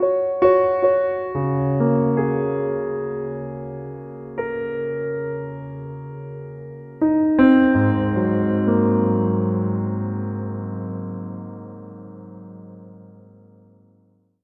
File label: Piano